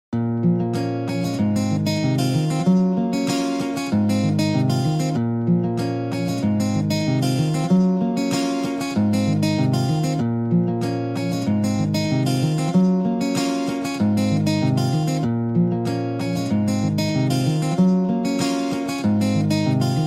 Guitar Cover

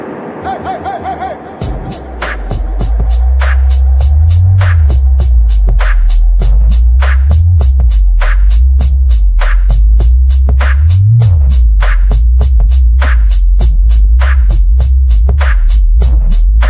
Bass Ringtone

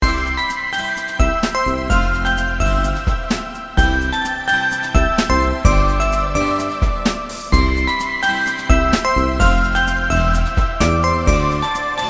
Happy Tone

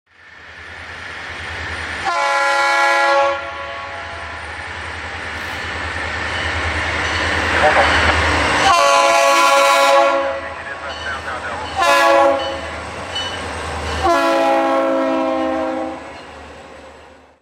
Train Horn; FEC 411

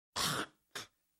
Spit

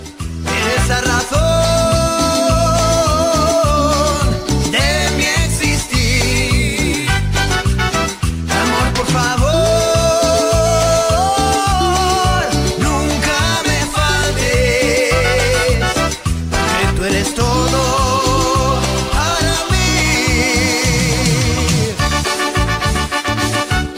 Cumbia

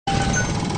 hacking; Bioshock

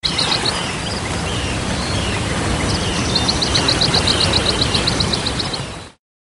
Tropical Waterfall

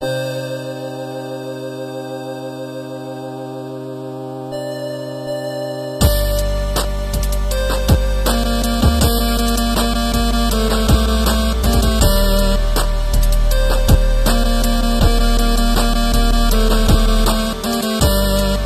8 bit

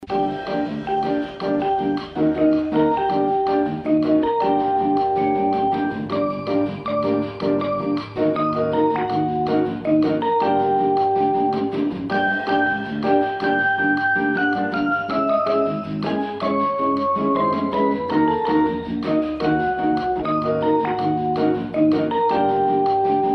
Elevator Music

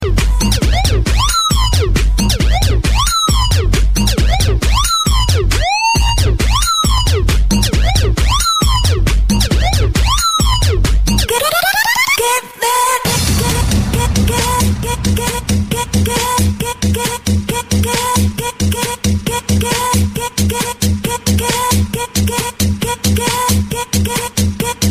Psycho Electronica

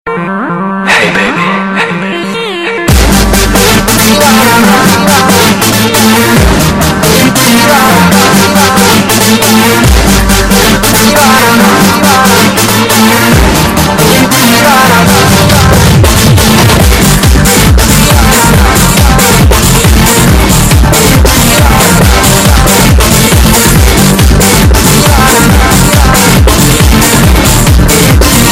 Fast Remix

